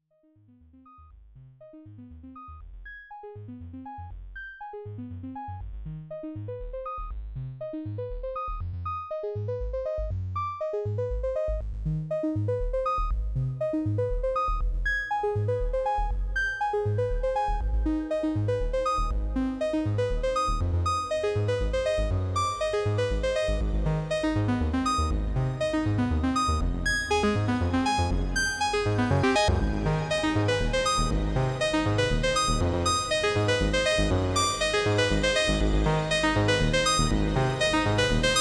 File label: Wavetable AAO